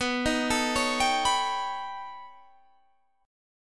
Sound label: B Augmented 9th B, chord